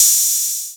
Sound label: XV Hat O, Roland XV-5080